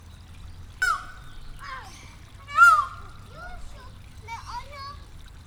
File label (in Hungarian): kettőt rikolt, páva; debreceni zoo 2019